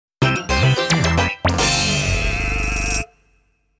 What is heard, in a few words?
Draw Fanfare; Nintendo - Mario Kart Wii; Video Game OSTs